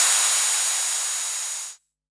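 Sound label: Analog SY1 Open Hat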